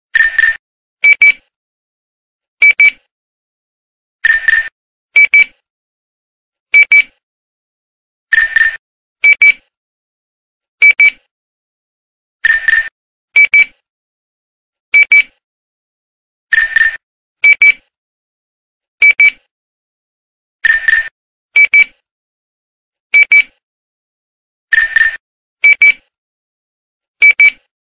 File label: Radio Nextel